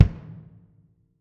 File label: Drum Set